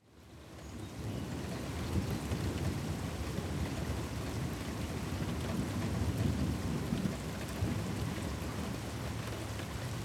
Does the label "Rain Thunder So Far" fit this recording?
Yes